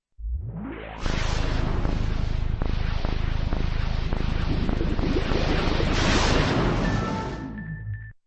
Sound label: xbox startup; xbox 2001